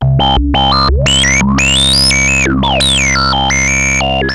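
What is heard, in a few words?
Sample and Hold Filter, SFX; Syntec; Wall of Sounds VOL-2; TECHNO-BOX; 90s sample CD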